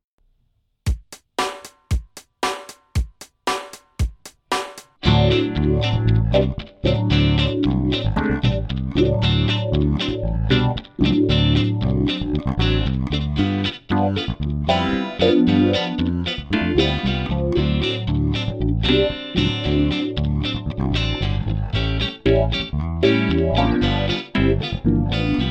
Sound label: straight 8th blues